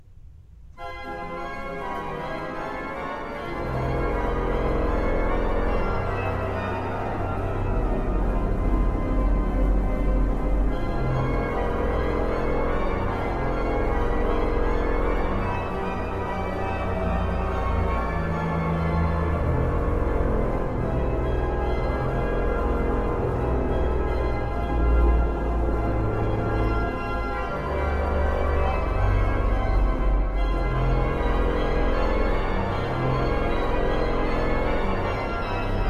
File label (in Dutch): orgelklanken; Bekende orgelklanken